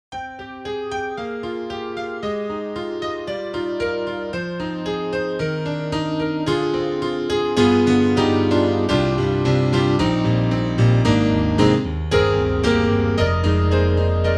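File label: YAMAHA EX5 For Ballads, piano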